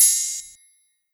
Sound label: Open Hat